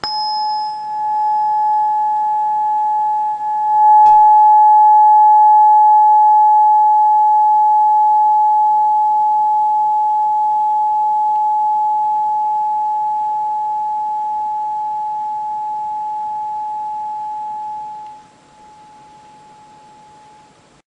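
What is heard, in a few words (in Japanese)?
852 Hz, 音叉; 第6チャクラ 紫; 直感力の覚醒、目覚め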